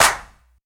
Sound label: clap one shot stomp; Claps